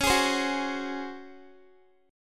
Db Major 7th Suspended 4th, Guitar; strummed

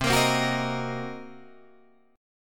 C Minor 7th Flat 5th Guitar Drop D, chord

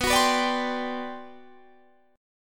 G Major 11th B

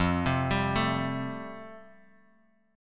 F Major Add 9th, Bass Standard; arpeggiated; 13,15,17,14; JGuitar

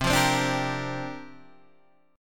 C 7th Suspended 2nd Suspended 4th, Guitar, Open D

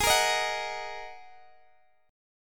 Ab 7th Suspended 2nd down Guitar DADGAD

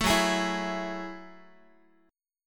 F sharp Minor 9th F sharp, Guitar; strummed; JGuitar

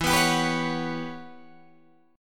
E Minor 6th Add 9th, Guitar, DADGAD

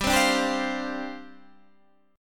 G 7th Suspended 4th G down Guitar Half Step Down

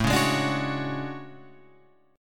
A Major 13th, down Guitar Open F; AM13; strummed; JGuitar

